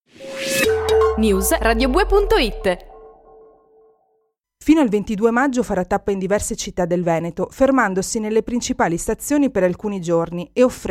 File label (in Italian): treno jingle